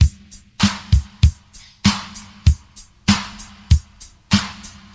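97 bpm fresh drum loop sample d key, kick tuned to the D note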